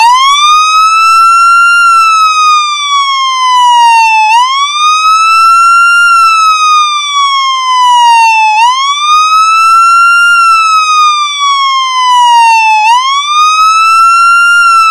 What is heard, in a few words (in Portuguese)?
WAIL MEDIO (POLICIA), sirene